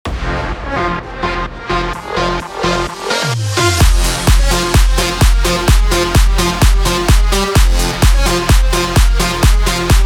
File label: BED ELECTRO HOUSE FMIN 128BPM